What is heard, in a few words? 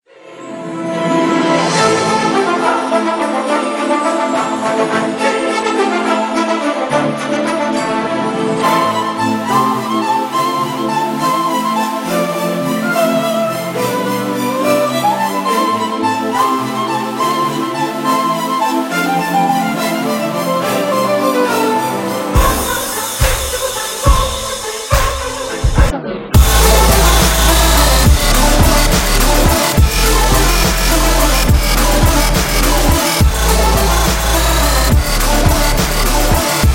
Christmas Dubstep Remix; Carols Of The Bells